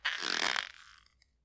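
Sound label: grip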